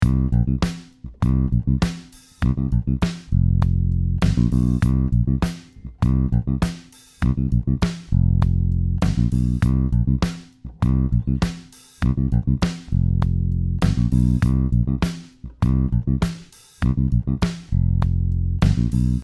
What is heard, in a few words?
Funk